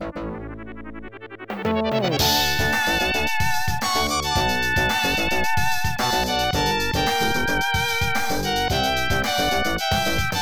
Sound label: Congratulations!; Yuuyu no Quiz de Go Go; Taito F2 System; arcade